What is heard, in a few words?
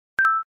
charge; oneplus